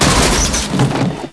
Crunch; Demo Man

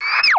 walrus; The Interactive Alphabet

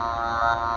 GROWL; Falcon4

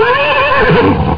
HORSE

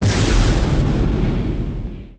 Xplosn

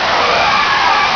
ski; NewSurfs; PC Action 1998 December

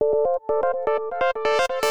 SYNTH PROGR 125; Keys

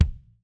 new game; Educatif; Comparaison

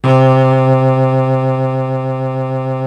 cello

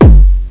dx with kick

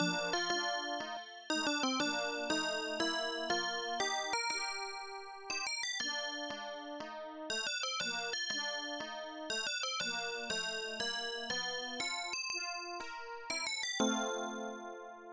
bells

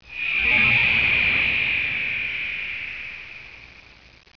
Creepy; WARLOCKSNDS

